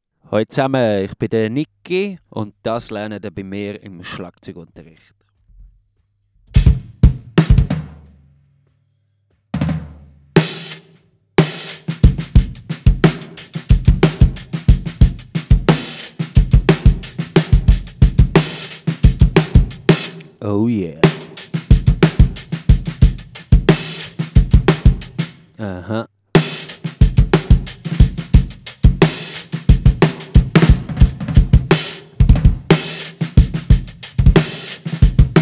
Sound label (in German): Schlagzeug